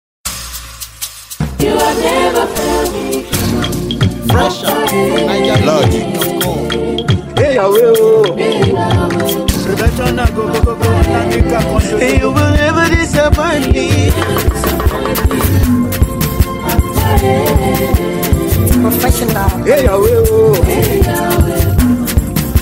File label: Professional Beat